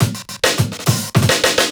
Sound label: Riddim Drum Fill 140